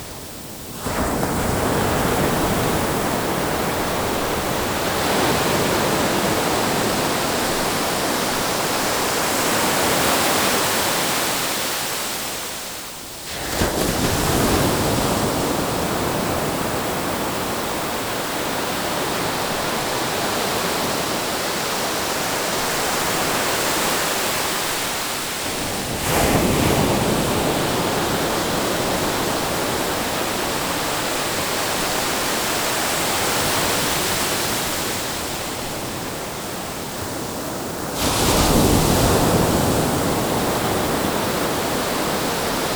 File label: Waves Crashing Ambience